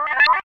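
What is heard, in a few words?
sphere blips medium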